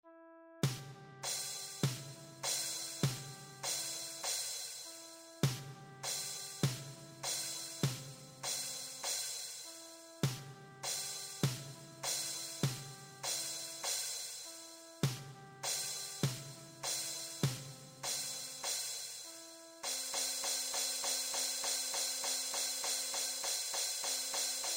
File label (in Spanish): INSTR; Salvemos la abeja